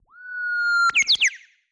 uguisu sing synth

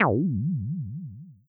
synthesizer vibrato bass

